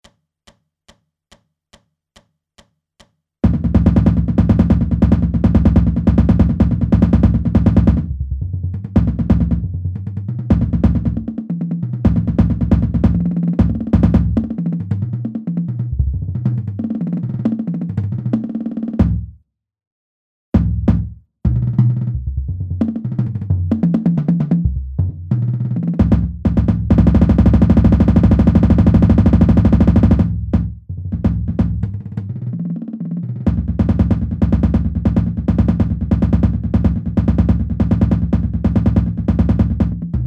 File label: bass only, drumline; Ditty